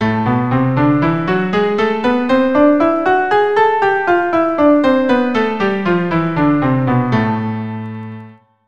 Am Melodic, keyboard

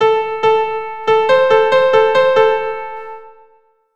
Eighths

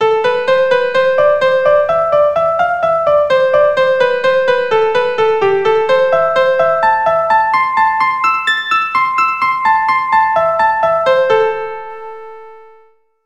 Scale

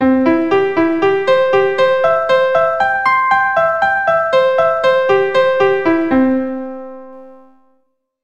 C Arp RH triplets; C Major Arpeggio triplets

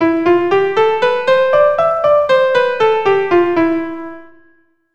C Major Phrygian, keyboard

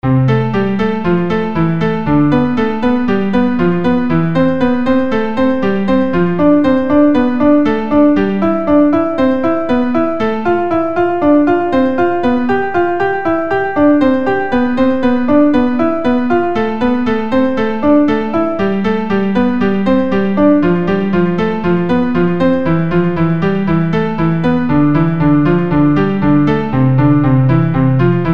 5 Finger 3 Tune Book, keyboard

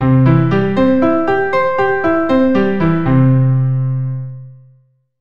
C Major Arp 100, keyboard; C Major Arpeggio RH

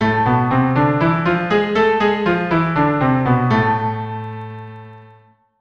Am harmonic, keyboard

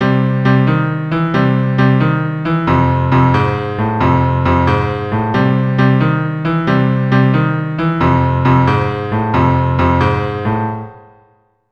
Boogie Woogie bass, keyboard

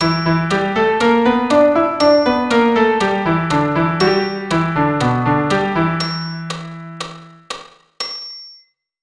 E blues

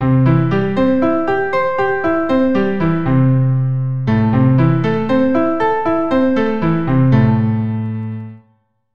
C - Am Arp, keyboard

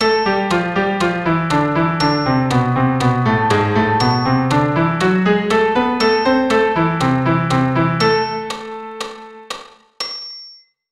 Am melodic

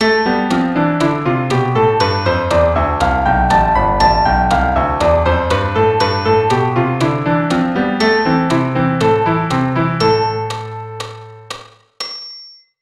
Am natural

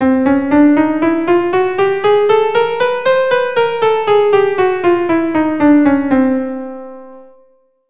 chromatic scale

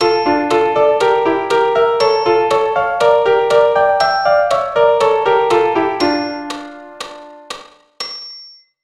Dm harmonic

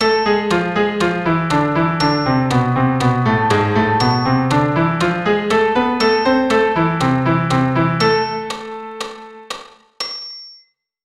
Am harmonic

